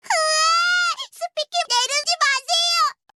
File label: yapping; speaki